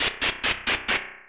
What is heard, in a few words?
Dungeon Stairs Descending; Open Zelda; Quest Designer 2.0.4b